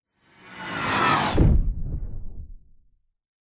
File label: science fiction missle pass whizz distant explosion; zapsplat